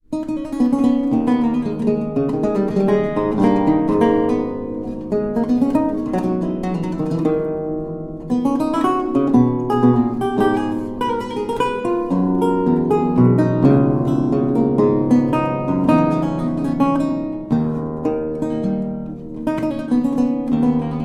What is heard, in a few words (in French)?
Courante, Luth; Les Cabrioles; Charles Mouton